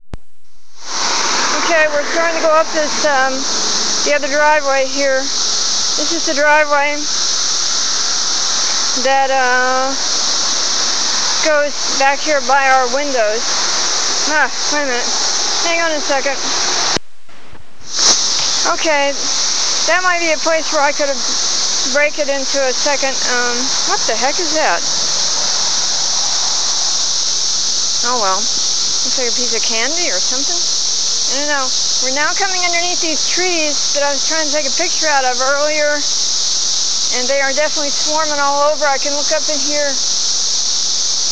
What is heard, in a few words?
cicadas